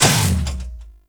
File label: Mech Run Stop; Nechro